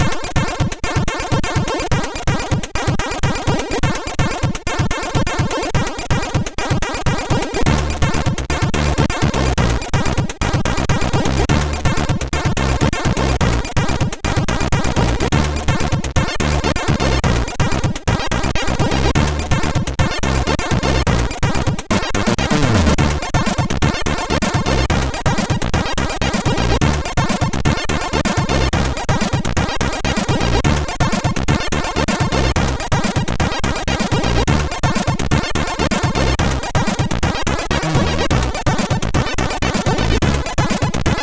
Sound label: Bossa, MOS6581R3